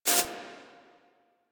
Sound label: Screen Glitch